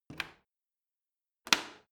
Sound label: washing machine snap button press out